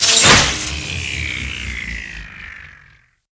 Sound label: Power Coupling Off